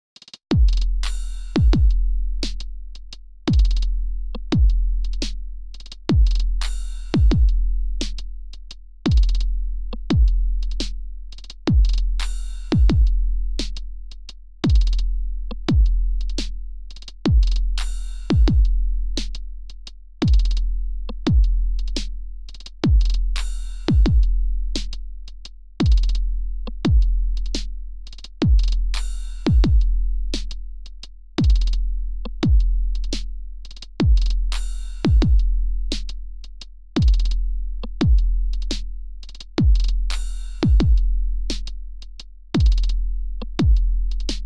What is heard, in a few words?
Character California Tube Mix 8x none; Metric Halo v4; Drumazone2